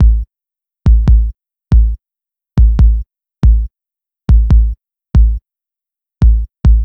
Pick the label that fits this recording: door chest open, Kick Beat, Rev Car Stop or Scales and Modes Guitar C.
Kick Beat